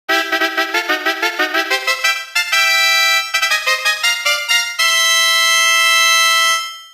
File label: Fanfare Special Reward